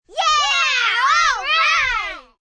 Kids Cheer Yeah, Cheering, small group of children; Crowd Sound Effects